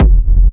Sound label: industrial techno kick oneshot - f, Nicely shaped, crispy and reverbed industri; kick drum